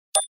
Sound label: button Move